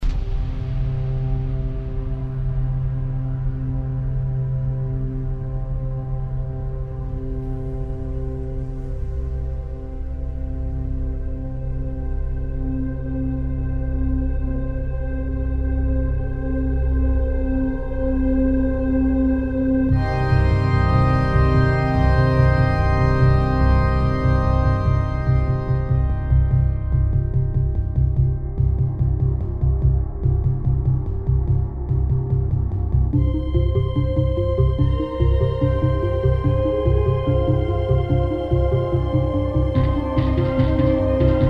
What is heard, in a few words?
Organ; Reciprocal; naked; Soundiron Alpha Organ; NKS Collections